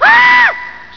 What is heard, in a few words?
ahhh